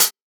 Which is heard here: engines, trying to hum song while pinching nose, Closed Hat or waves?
Closed Hat